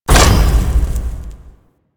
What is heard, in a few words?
Scrap Container Open; Warframe; Missions; Zariman; Zariman Assassinate